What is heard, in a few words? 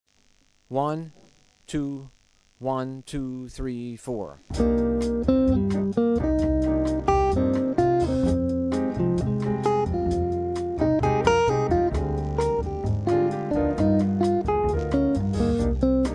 Penta Improv